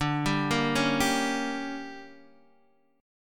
D Major 7th Suspended 4th Sharp 5th, Guitar Standard; DM7sus4#5; arpeggiated; JGuitar